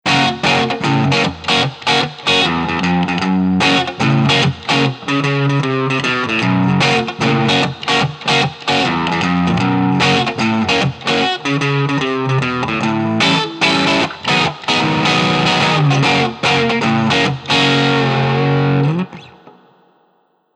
Bridge Neck Tube Screamer

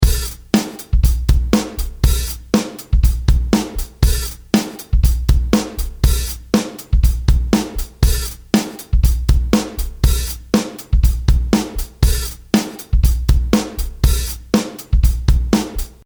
Drumloop Squash

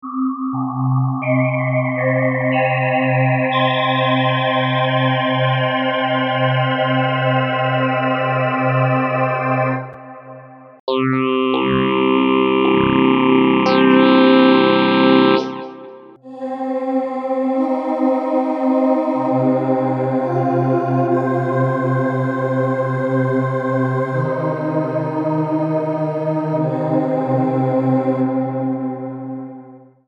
formant

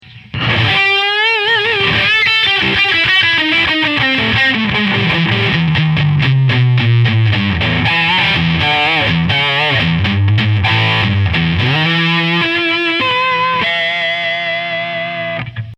Lead

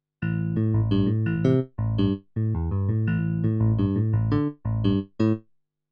K150 PHS

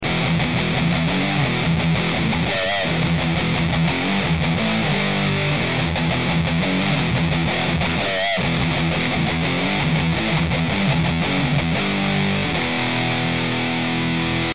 Humbucker Modern Metal

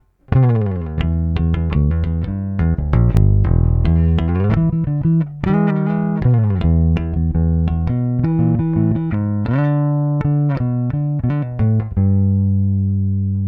Neck full finger

